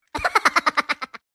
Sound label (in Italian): Risata Minions Short, tono di notifica; per Cellulari Android o Iphone